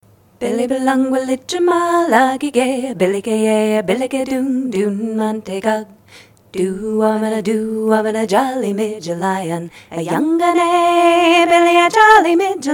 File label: Aussie Greeting Song